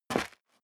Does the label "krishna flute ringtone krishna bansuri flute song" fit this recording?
No